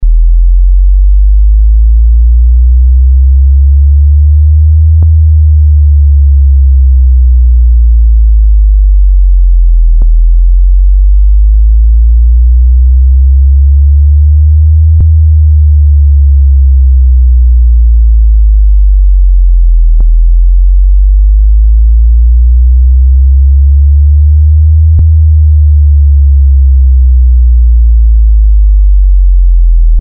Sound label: 40hz to 80hz slow warble